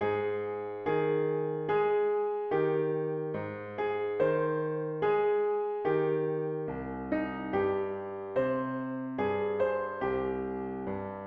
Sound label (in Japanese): ピアノ